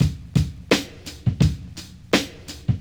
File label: Ensoniq ZR-76 Dope